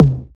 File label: low mid urban tom drum c key